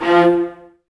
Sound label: String Ensembles, Violas, VLS Detache; Miroslav Vitous